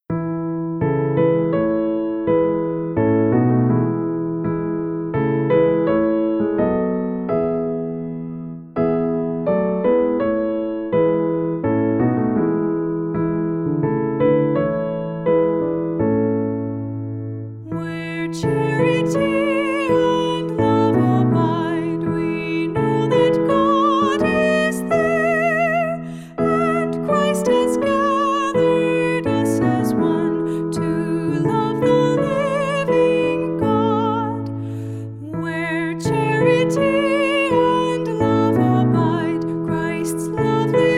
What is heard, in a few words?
hymn